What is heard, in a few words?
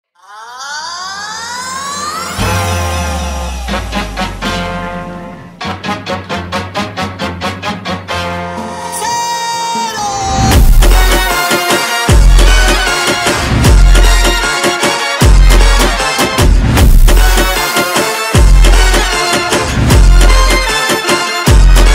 mexican phonk sped up